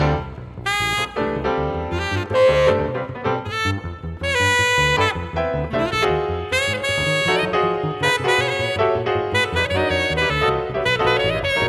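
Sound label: battle sax piano; stranglehold; mus mega battle2 sax pno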